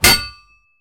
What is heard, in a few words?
Sword Hit